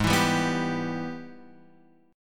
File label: Ab Minor Major 7th Sharp 5th, chord; strummed; JGuitar